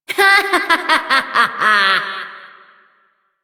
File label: Laughter